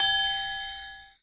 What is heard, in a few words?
Pickup Magic Bells; Pickups